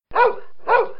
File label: barking